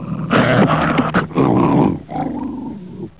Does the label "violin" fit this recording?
No